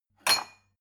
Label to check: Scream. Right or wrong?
Wrong